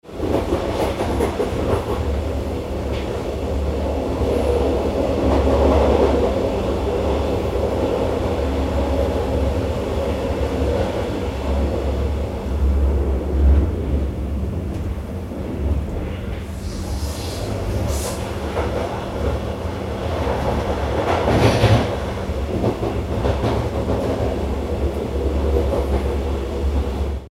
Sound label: regional train track switches cabin inside